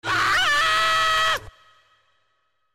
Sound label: Fight Scream; Scrat